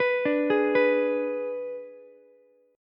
Ab Diminished Ab, Ukulele; Abdim arpeggiated; JGuitar

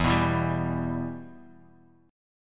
E-Minor; 12,14,14,12,x,x,x,x

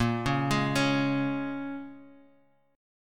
A sharp Minor 7th, A sharp, down; A#m7; arpeggiated

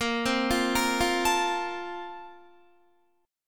Bb Major 7th Suspended 2nd, Guitar; BbM7sus2 arpeggiated; JGuitar

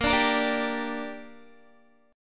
A sharp 6th, Guitar; A#6 strummed

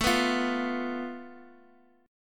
A Suspended 2nd Sharp 5th

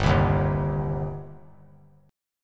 G Suspended 4th Sharp 5th G, down; Gsus4#5 strummed; JGuitar

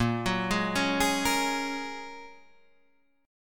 A sharp Minor 7th Sharp 5th; arpeggiated; 6,9,6,6,9,6; JGuitar

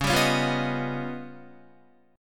Db 9th Flat 5th Db down Guitar; 4E,4A,5D,5G,5C,6F; strummed; chord shape; JGuitar

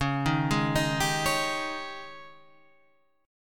Ab Major 7th Suspended 4th Db

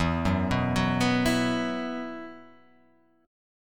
E Suspended 2nd

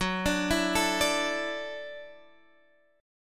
E 7th Suspended 2nd Suspended 4th F sharp, guitar

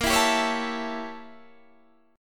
A 7th A sharp; A7/A# strummed

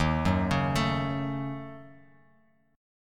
Eb Minor 7th Flat 5th, Bass Standard; arpeggiated

